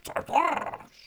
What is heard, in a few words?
Mustache Sprout Amb Vocal; Friendly; Sproutfolk; Fey Hub Sprout